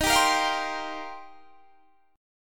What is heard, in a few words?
D sharp 6th Add 9th D sharp; D#6add9 strummed; 23,22,20,22,20,20